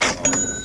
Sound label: Bonus Count; Cyclone II